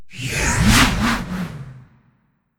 Wind Sirocco

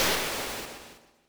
Enemy defeat; Touhou Jitsuzaisou Dream Logical World

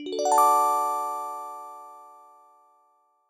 Completed task; Syrup 2 Candy Alchemy RPG

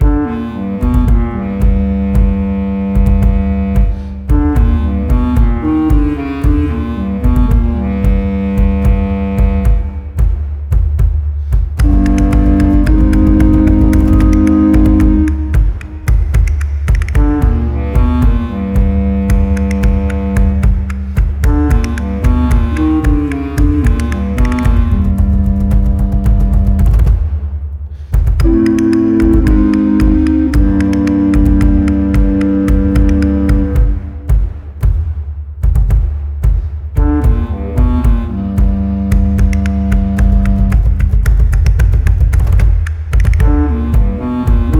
Musica loop beat 112; Area01 DarkJosh

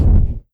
MAGIC SPELL Flame Mono

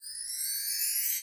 Skill Fly; In Characters Skill Fly

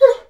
Dog Whining Begging; enemies

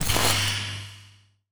Enemy defeat; Touhou Jitsuzaisou Dream Logical World

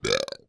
AMB China 3D Amb; rabbids multiverse; iOS, macOS, Mobile

